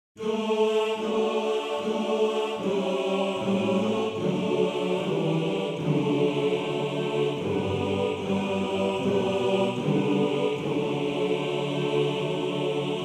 Hör in den E Chor

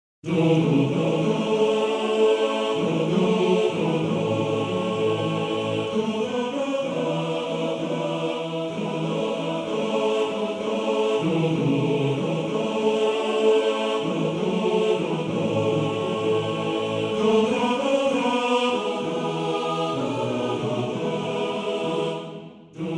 Chor

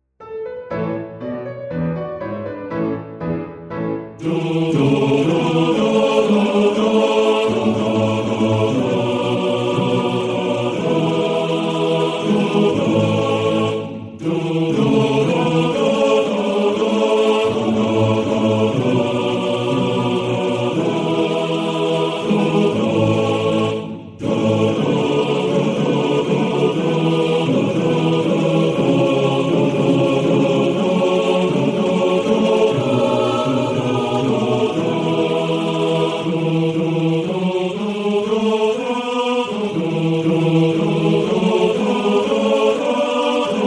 Chor; Soldateliedli

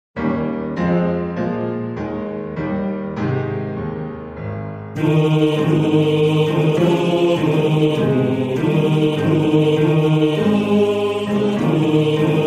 Männerchor Bass 1; Männerchor Elsau

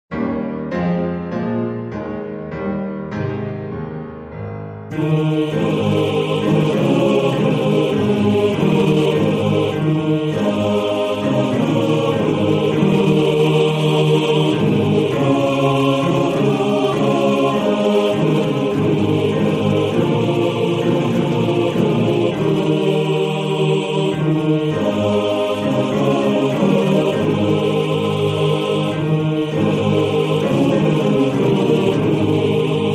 Männerchor Chor; Männerchor Elsau

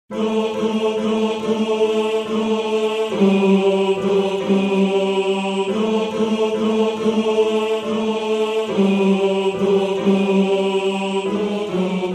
Tenor 2; Lobet den Herren

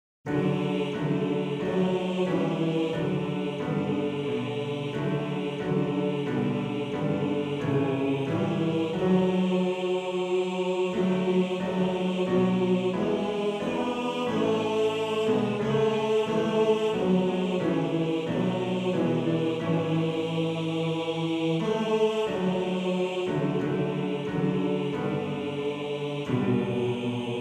Motette, Bass 1

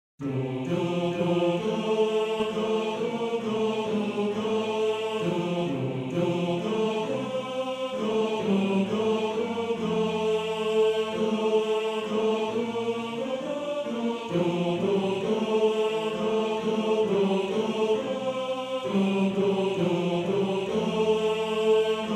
Bass 1; Heimetvogel

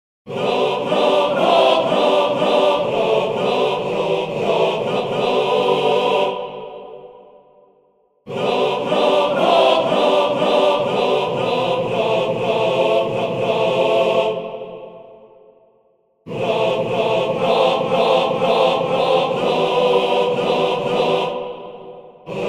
Chor

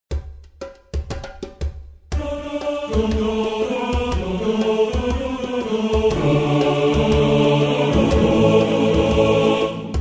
Chor; Sananina

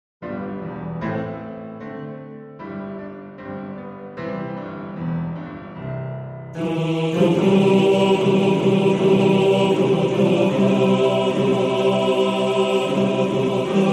Einsames Glöcklein Chor

